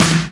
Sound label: Snare; Vengeance Samples